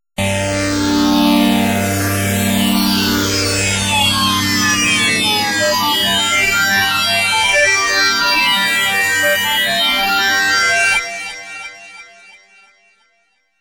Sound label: Wave